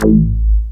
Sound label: moog, bass; Ueberschall; Techno Trance Essentials